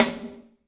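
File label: snare drum, Drums; Bob Clearmountain Drums I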